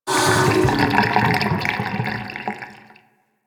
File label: Pipe Gurgle Rand Amb; Ambience; Warframe; Grineer Galleon